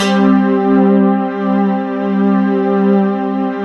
SYN WET, Roland; Sound & Vision - Gigapack I CD 2

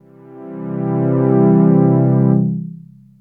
Strings, dx7

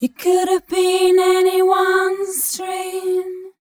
f mel d, fem Vocals

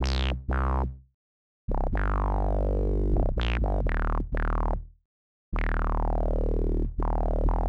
Bass synth squelch C, 125; PowerFX - Nu Jazz House

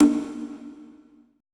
CONGA R; BPC DRUMS

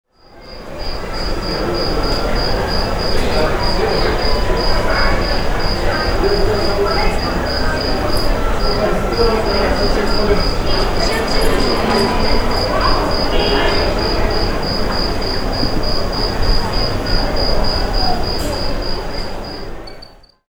AMBIENCE, Arabian Traditions; USB Soundscan vol.43; AKAI

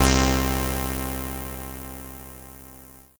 Synths Note C

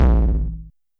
Tom Ana Dist, YAMAHA RM1X

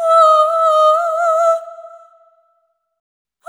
Servitude, vocal samples; Sonic Foundry (Sony Creative Software) - Pocket Diva World Class Vocal Samples; Op-Erratic Loops

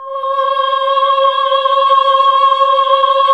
OHH C#, Nuns Ooohh; Sound & Vision - Gigapack II CD 1 (Roland); HAL NUNS AAH OOH

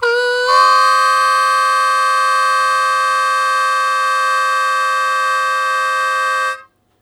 Blues Chord Attack Ab1, Harmonica; Zero G - Harmonica

